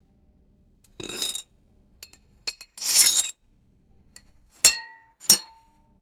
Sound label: metal knife scraping inside glass jar